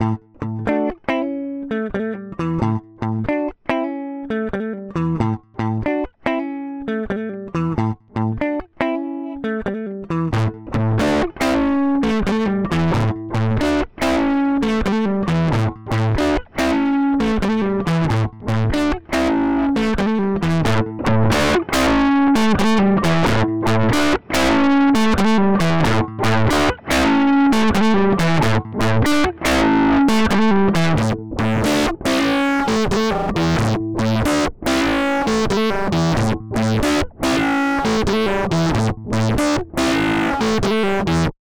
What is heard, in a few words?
corrosion on electric guitar